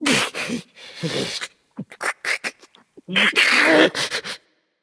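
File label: non-verbal boohoo; smite; voicepack; jingwei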